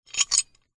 metal sequence slide gear change mechanical gear mechanism accent; ancient game